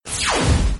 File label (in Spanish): disparo laser